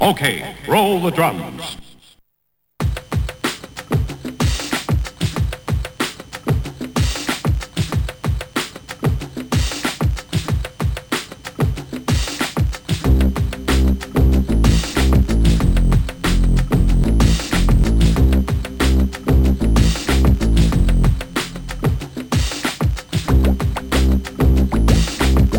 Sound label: mello drums; Dynamik; Trackers; Modland